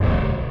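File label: enemy destroyed; Parsec47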